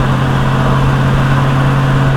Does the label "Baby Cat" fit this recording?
No